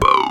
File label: boom; PlatinumPhattCD1; E-MU Producer Series Vol. 8 – Platinum Phatt; Mo Phatt